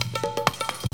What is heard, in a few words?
TABLAS; AMG - Now CD-ROM (Roland)